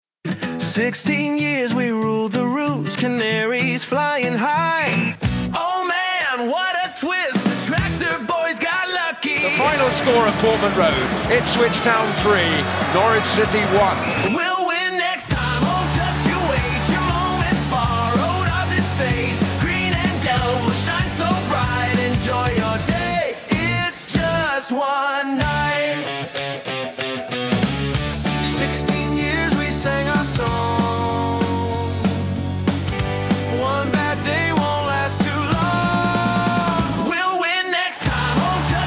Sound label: SONG; NCFC; hybrid ai sung jingles